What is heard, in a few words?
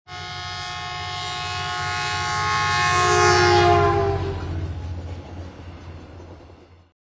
3D train tone